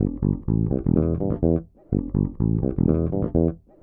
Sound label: DEEPHOUSE BASS 125 A